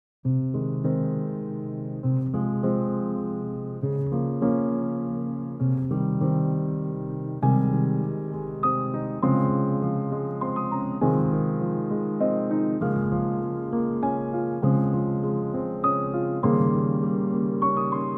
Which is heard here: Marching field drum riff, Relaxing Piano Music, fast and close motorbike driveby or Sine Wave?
Relaxing Piano Music